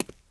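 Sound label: boot wood walk left, footsteps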